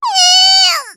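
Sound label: pain critical death; voice packs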